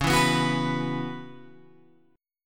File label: Db Minor 11th Db Guitar Open G